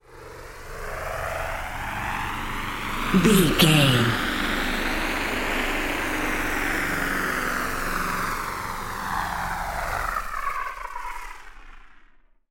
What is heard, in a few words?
ominous war plane